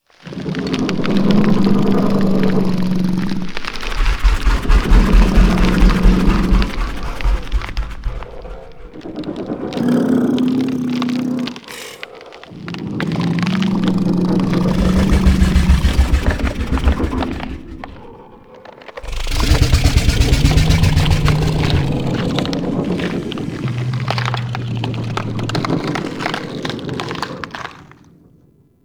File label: Monster Door Mouth Loop; Monster Door Key